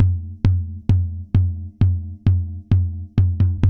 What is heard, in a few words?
TAMTAM; ILIO - Ethno Techno